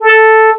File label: brass, fm synth